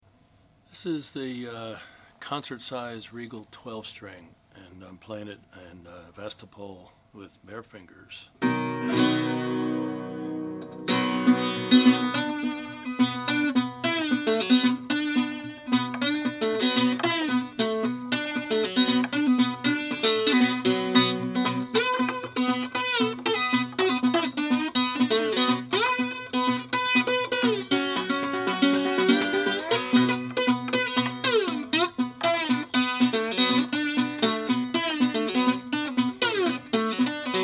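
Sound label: regal concert size natural brazilian, Guitars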